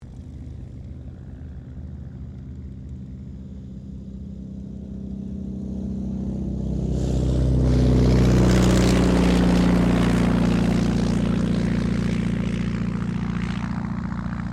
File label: AIRCRAFT RYAN STM-S2 TAKEOFF; 1939; Airplanes; Vehicles